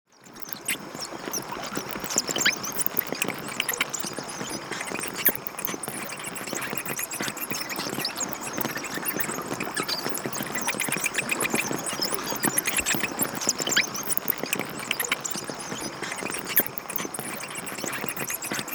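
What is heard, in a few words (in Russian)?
Летучие мыши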